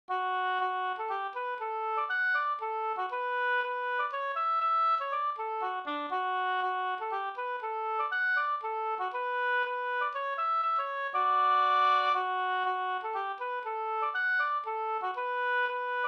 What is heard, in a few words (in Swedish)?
hamburska; Kavelbrolaten; Leffler nr36 Sd